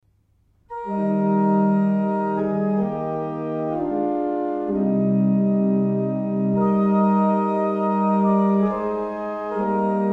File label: Double chant, barrel organ; Faulkbourne